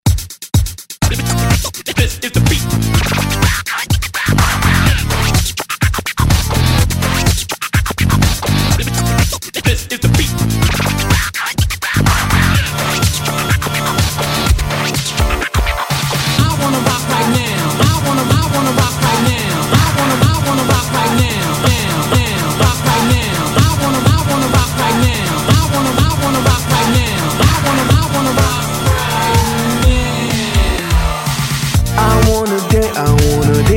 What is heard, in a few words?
Scratch Intro Outro 125Bpm